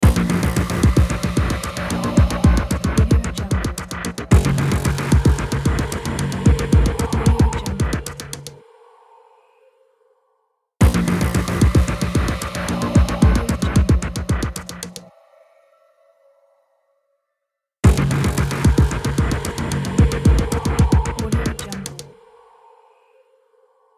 rhythmic scifi logo

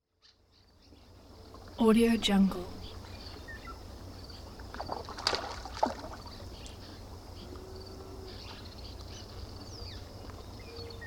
birds on lake

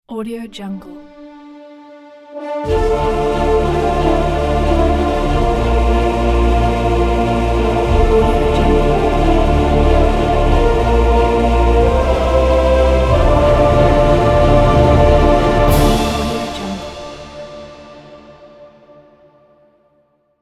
epic intro logo

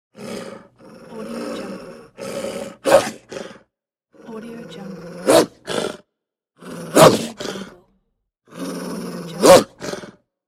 wolf snarls; pure-noise

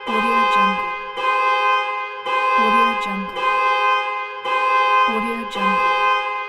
distant car alarm

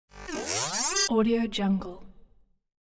ascending futuristic digital accend

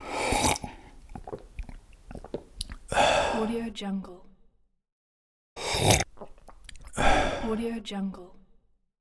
drink

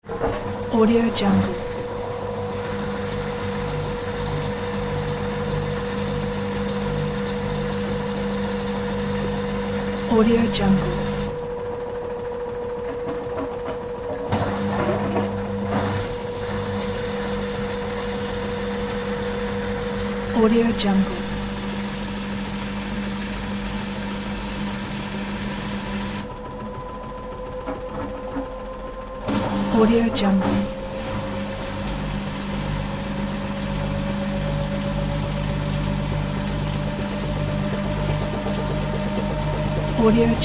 washing machine

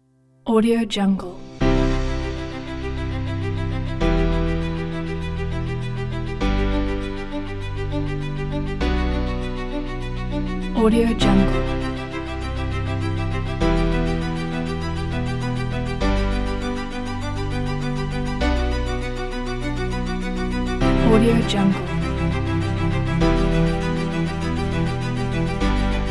epic emotional inspiration

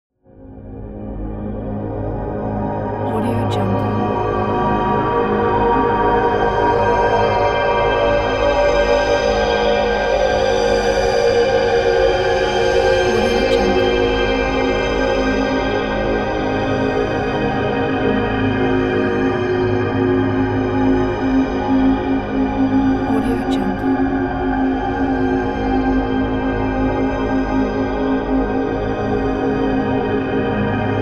atmo drone armenian sun high; smartsoundfx

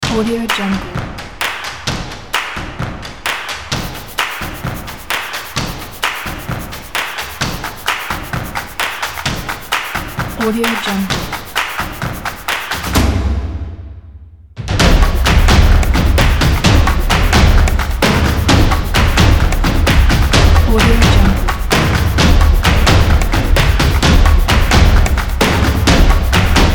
sport drums

